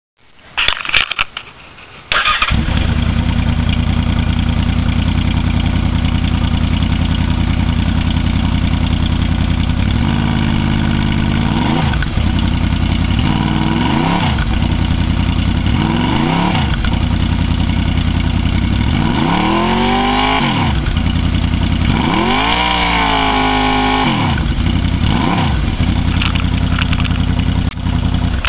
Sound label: staintune baffled